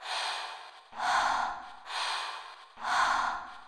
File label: breathe; Drum loops